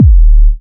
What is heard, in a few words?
Minimal Kick, Drums, Kicks